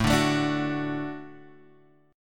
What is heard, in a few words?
D Major, A